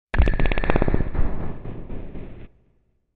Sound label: Bass Impact Weird; impacts